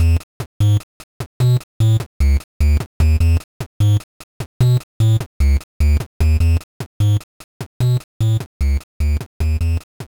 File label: BGM STAGE; Art Style Pictobits; Picopict; DSiWare; NDS